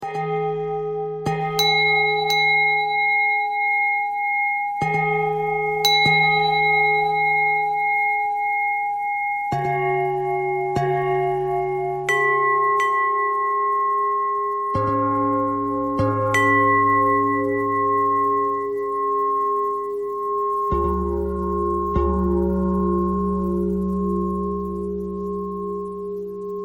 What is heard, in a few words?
bell thread pentatonic